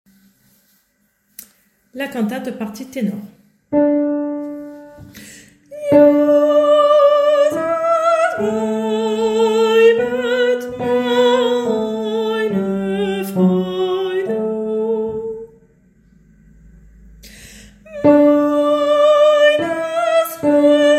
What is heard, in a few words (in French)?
partie tenors; Bach cantate jesus beiblet